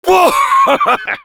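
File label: laugh short, French; Heavy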